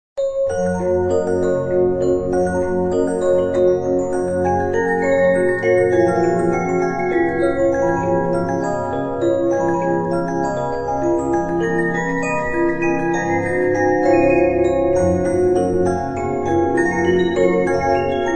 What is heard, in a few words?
music box; lupinball macos windows gamerip 2017